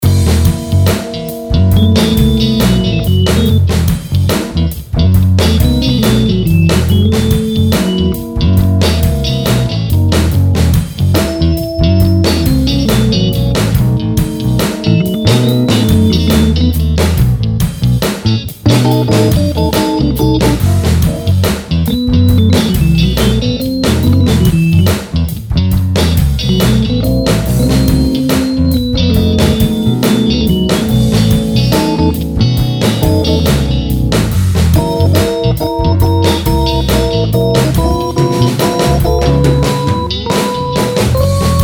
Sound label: Funking Out In F Sharp 70 Solo Keys; Blues Solos